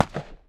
smg dist; weapons

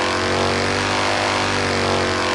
high, engines; RS7

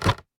spin; rise chest

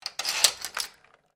fish weight

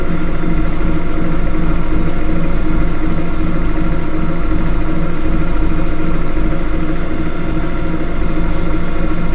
machine motor

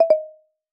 decline

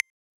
hover

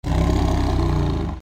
lion; rise hunting; animals

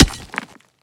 pickaxe hit

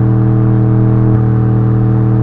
low, engines; rise vehsounds; MANSORY

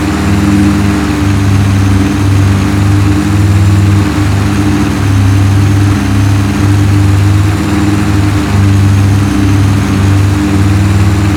low, engines; RS3

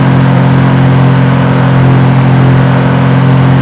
high, engines; AUDI

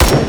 FN FAL; weapons

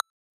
sent

